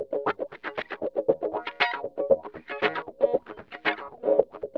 SPICEY WAH, Funk Gtr & Wah; PlatinumPhattCD2; E-MU Producer Series Vol. 8 – Platinum Phatt

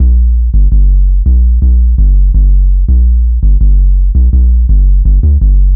Hard 808, Bass Lines 166.5; Zero-G Jungle Warfare 1, 2 & 3; 90 s Sample CDs

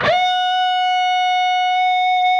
PRS G, PRS LEAD, Guitars; Spectrasonics; Hans Zimmer Guitars VOL-1

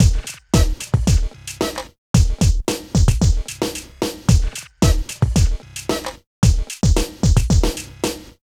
Beat

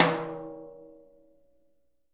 Living Drums, JZ XTOM 1

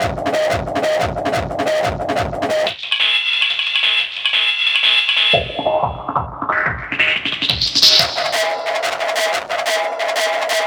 Victory Beat, Drum & Bass; Fluid Dynamics

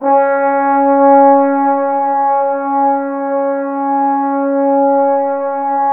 trombones C#5; Q Up Arts; Denny Jaeger Private Collection

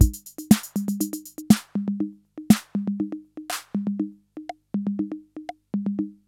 CR-8000 LOOP, DRUM LOOPS; Syntec; Wall of Sounds; TECHNO-BOX

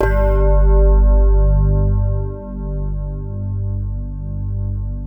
crystal pad; Sound Gallery - Charged

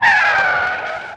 Skid; Classic SFX; musicradar; cartoon caper

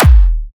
drum kick experimental zap; Virtual Riot; Drum Hits

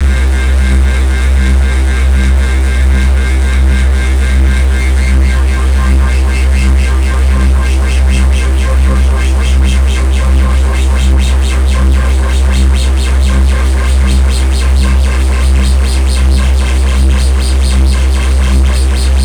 Venus Modwheel Action, Basses; AMG - Prototype Drum & Bass

